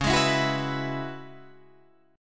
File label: Db Minor 11th, Guitar, Drop D